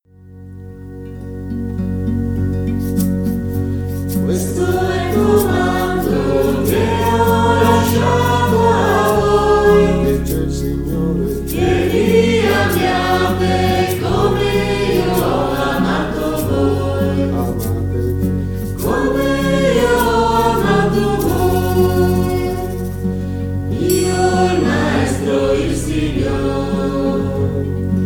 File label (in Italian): Canto